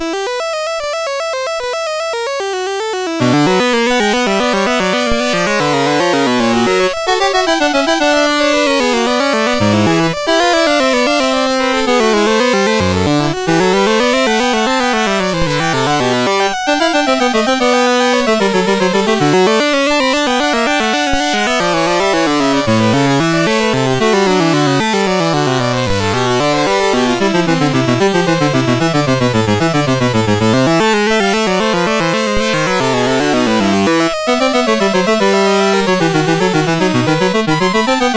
Fugue in E Minor, CSG8580R5

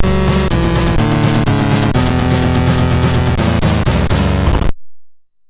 intermission sid MOS6581R3

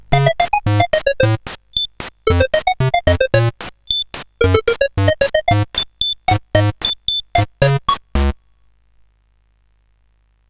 sid, CSG8580R5; Coco-Notes

